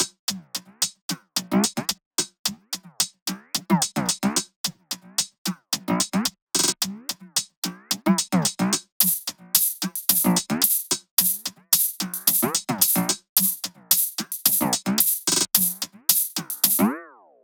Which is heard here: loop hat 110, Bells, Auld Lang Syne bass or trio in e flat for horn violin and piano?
loop hat 110